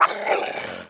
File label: throwable attack